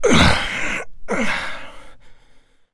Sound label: breath end